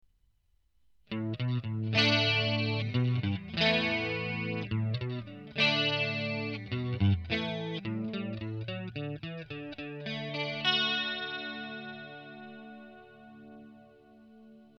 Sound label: clean chorus, guitare